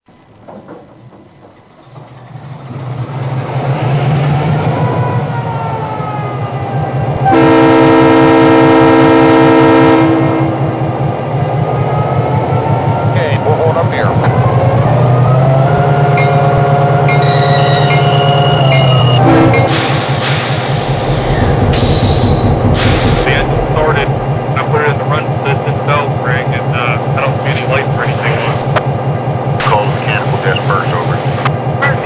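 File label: EMD F3, locomotive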